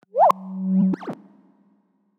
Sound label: Tech, Weird Side Samples